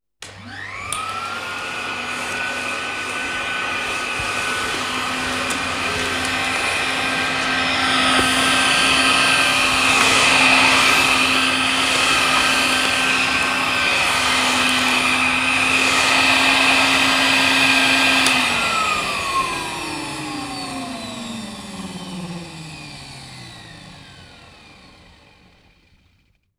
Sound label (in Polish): odkurzacz